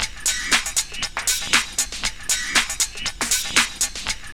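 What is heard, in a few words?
drum loop; X-Static Goldmine 3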